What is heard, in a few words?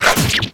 stab swipe